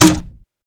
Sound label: Grenade Fire